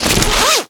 equip armor; garrysmod

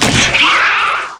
alien pounce; garrysmod

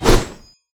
kata swing; garrysmod

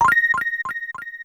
lvl; garrysmod

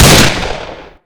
pistol

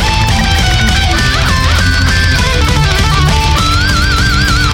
guitar gun solo; garrysmod; weapons; mp5 guitar

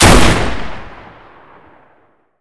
lever action; garrysmod

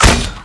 nade launcher; garrysmod

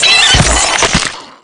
death; garrysmod

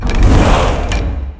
witch curse start; garrysmod

